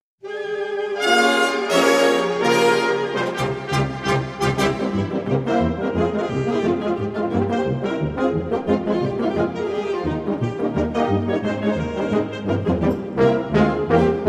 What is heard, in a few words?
cossack wedding dance